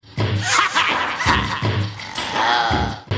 creepy evil crazy laugh

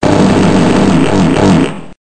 gelatin farting

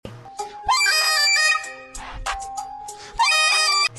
goofy ahhh scraem

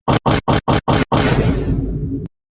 vine boom repeated

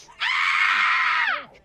ahh

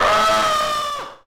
jumpscare; fnaf 2 a childs past ii

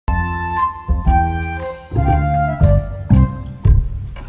music; mr bean

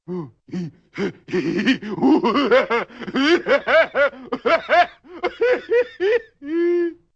goofy stupid laugh

